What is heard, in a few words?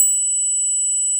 Carillon C6